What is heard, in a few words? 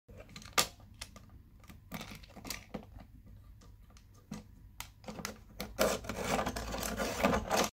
Pencil Sharpener